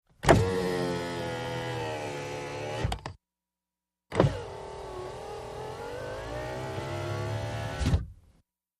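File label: Mitsubishi Montero interior: Drivers side electric window open & close; VEHICLES MITSUBISHI MONTERO: INT: Drivers side electric window open & close.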